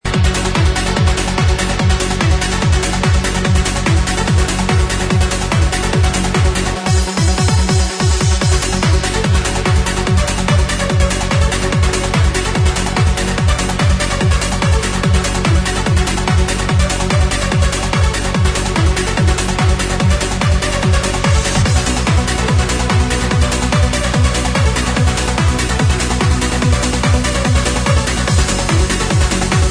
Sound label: trance